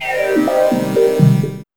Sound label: SYN SWP